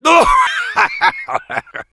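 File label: laugh happy, French; Heavy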